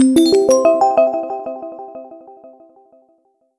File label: Reward Training; Horses 3D; 3DS